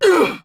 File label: Pain, Battle; Warframe; Dax; DaxRanged; en